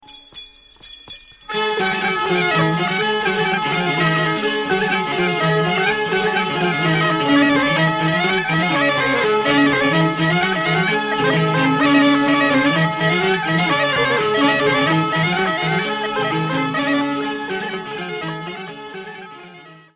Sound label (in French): Bourree - France